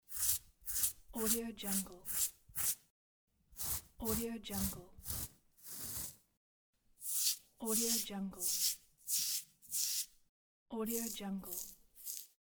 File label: body itch slow scratching